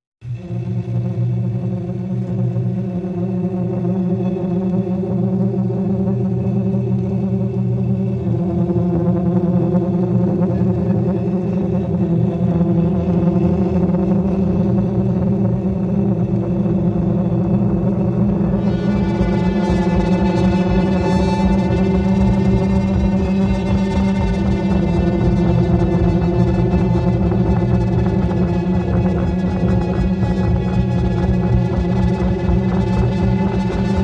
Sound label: Bed Mix